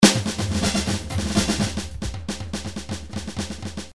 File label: drums 120 fill; Paul Kodish Dangerous Drums